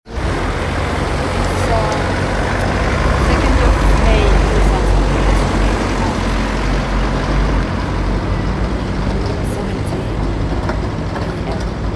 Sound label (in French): masque Lesco circulation